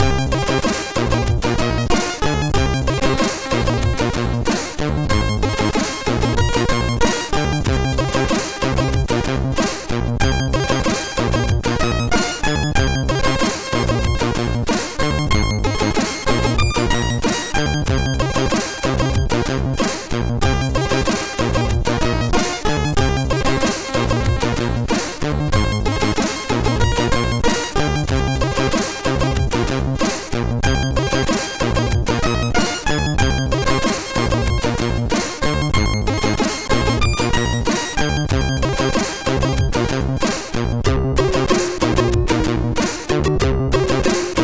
Rain Fan sid MOS6581R2

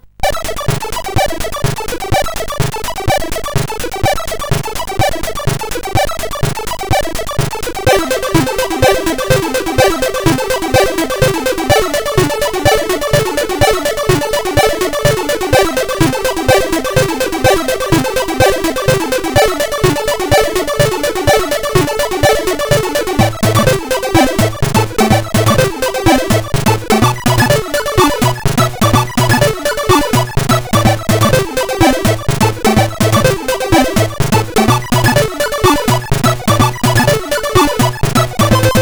Intro, SID CSG8580R5

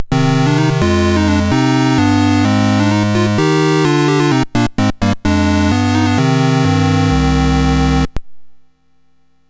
Dragonworld sid MOS6581R4

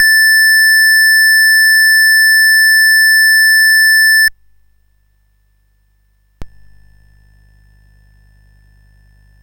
Dragonworld sid CSG8580R5

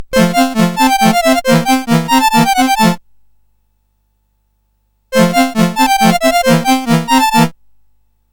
Polonaise, CSG8580R5

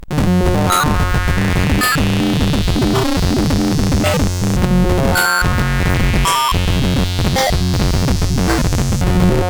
Grungy, CSG8580R5

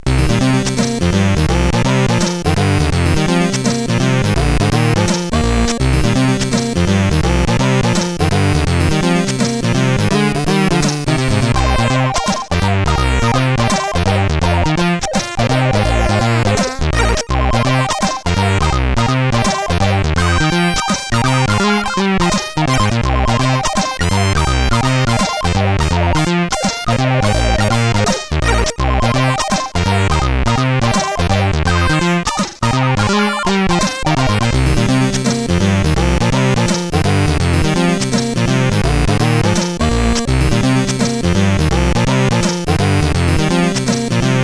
Orcus dark lord, CSG8580R5; hvsc

